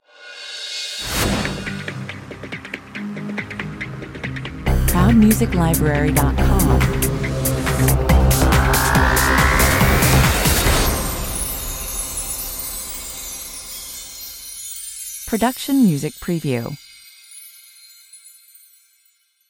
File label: presenter jingle 140 bpm